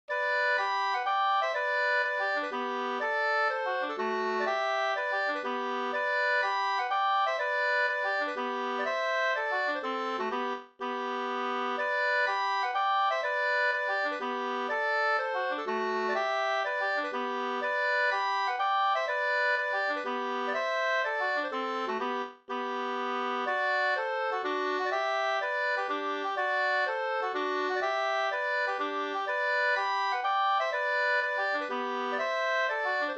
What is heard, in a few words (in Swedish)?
polska, 2st; e Gustav Skoglund StoraTuna